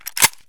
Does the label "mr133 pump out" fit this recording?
Yes